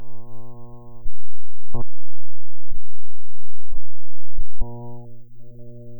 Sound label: loud